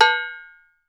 MAMBO COW, COWBELLS; Voice Crystal - Steve Reid Definitive Percussion

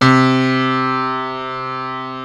U-20 PIANO; Rubber Chicken Software Sample Bank

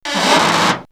Creaking Door; X-Static Goldmine

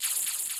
SY77 TEAR, drum; Crypton - DrumBase5000; SY77 DRUMS

